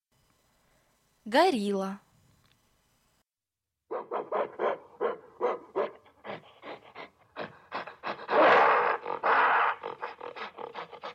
Горилла

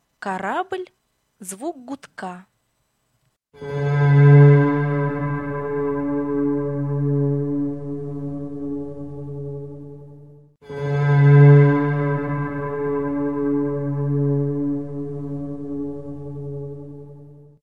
Корабль звук гудка

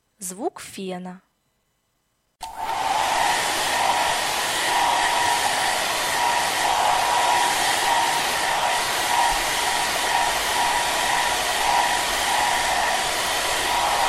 Звук фена

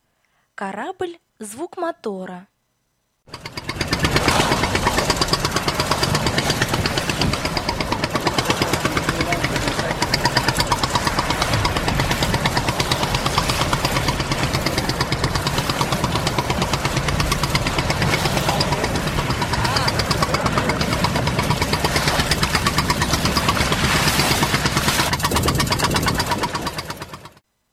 Корабль звук мотора

